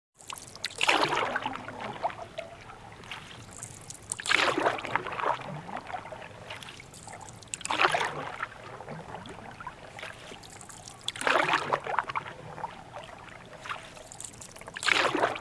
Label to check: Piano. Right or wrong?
Wrong